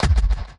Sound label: Kick; BlissedOutBeats; Touch Loops; Drums